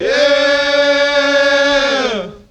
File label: "yeah" group of men sung